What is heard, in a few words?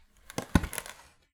Palette, WoodenPalette